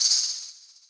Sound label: EQ Perc, Percussion Mix